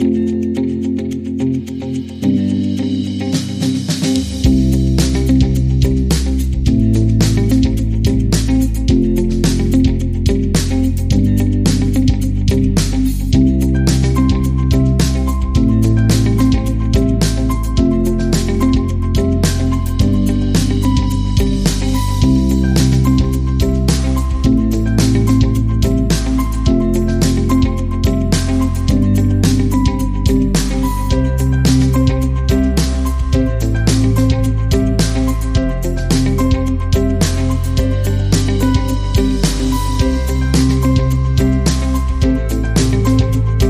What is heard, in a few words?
Acoustic Inspiration Underscore